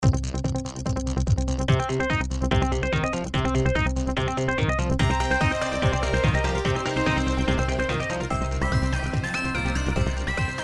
Fight; Adventure of Heroes; Android

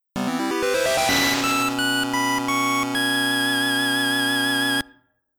Victory!; the frog for whom the bell tolls

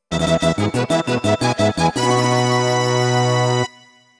Race Start Fanfare

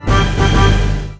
Power Up; rayman 3 hoodlum havoc gc ps2 windows xbox 2003

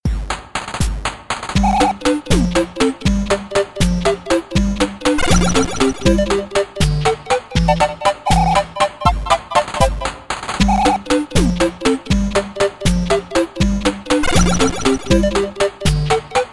8BIT Cross Road

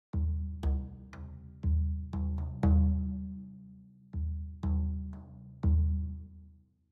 Citadel Music Drums; Frozen Flame; windows

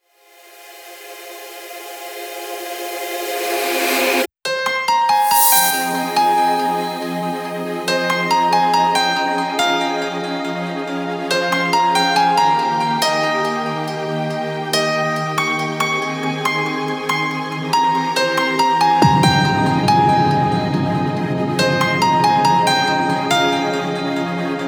Lullaby Pumpin' Trance Mix; Mirage Lullaby

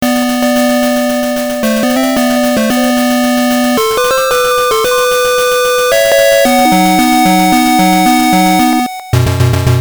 VS Mode Win

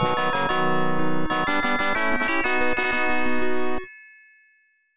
Floor Clear Music; The Return of Ishtar; PC-9801

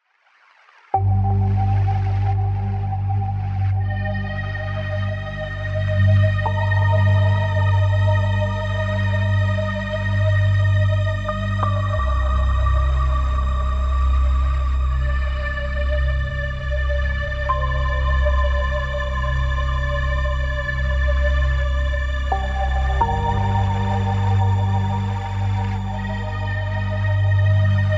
Ambient Ver.; Sanabi

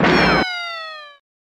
Hit Object; tin star; snes

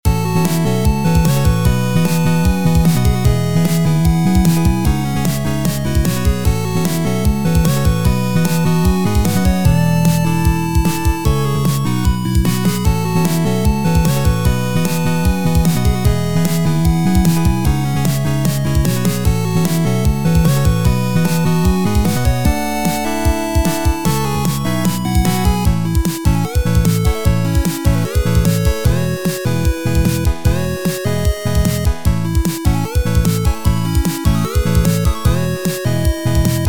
Gameplay music loop; Devil's Cauldron; android game music

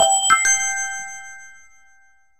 Item Collect

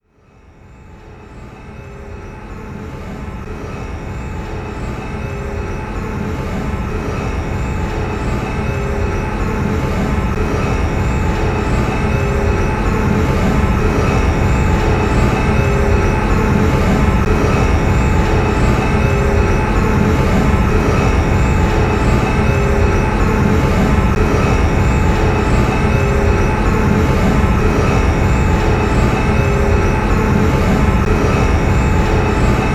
Machine Idle start

